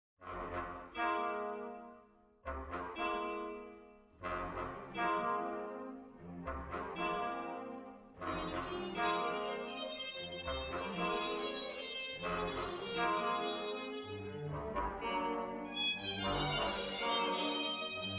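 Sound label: orchestral; Noir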